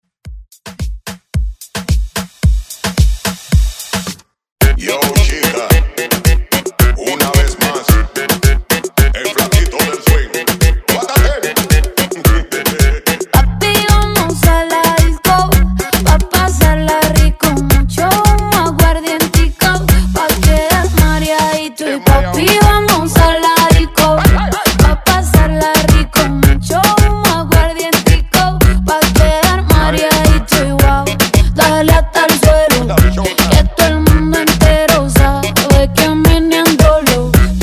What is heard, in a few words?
Moombahton Redrum